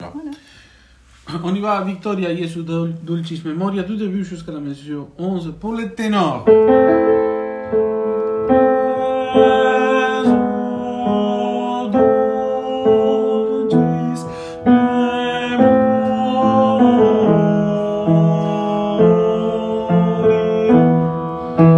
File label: Jesu dulcis memoria tenor; mesures 1-11; Victoria